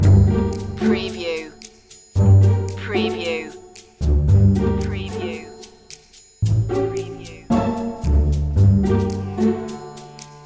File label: pondering loop